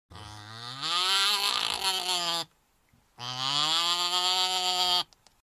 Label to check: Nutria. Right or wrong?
Right